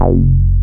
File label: PPG WAVE, OLD SYNTHS